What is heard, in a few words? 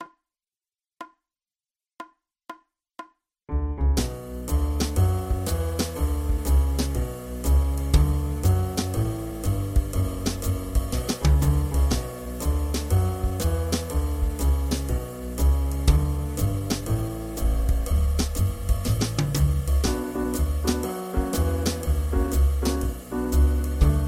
bouree, Cello; bach